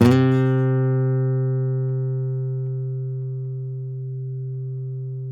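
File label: nylon, FLAMENCO, Guitars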